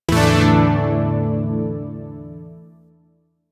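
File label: Shawzin Pentatonic Major Chord; Zariman Shawzin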